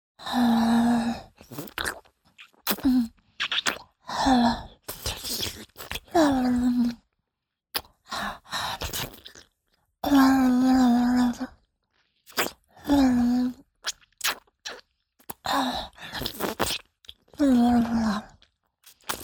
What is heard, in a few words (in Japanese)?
フェラチオ(中):亀頭の周囲を舌で沿うように舐める; 実用ボイス抜粋集